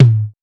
Original Tom One Shot A Key, tome drum sample tuned to the A note